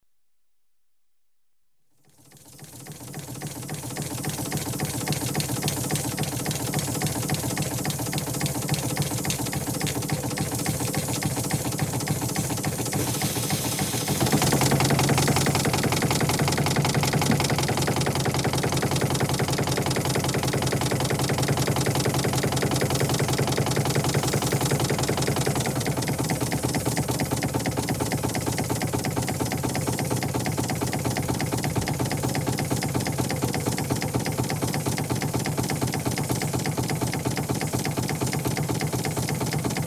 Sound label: engine running, Ships & Boats; The BBC Sound Effects Library